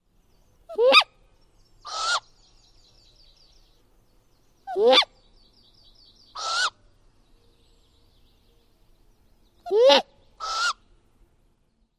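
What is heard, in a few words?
female g'waik and nestling call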